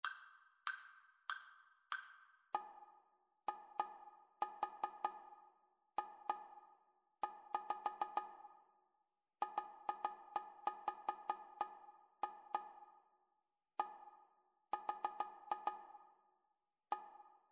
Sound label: rhythmic dictation